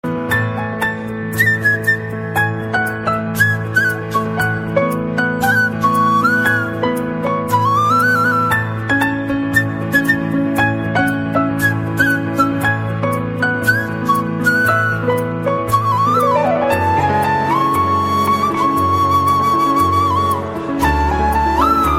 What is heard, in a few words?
flute of krishna, ringtone